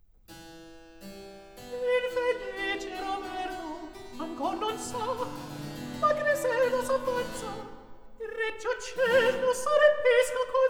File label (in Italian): Recitativo; Atto I; Scena 9; (Corrado, Griselda); Infelice Roberto ancor non sa; Antonio Vivaldi (1678 - 1741); Vivaldi - Griselda (2006)